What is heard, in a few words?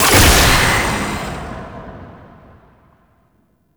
Incarnon Wrist Gun Alt Shot, Weapons; Warframe; Tenno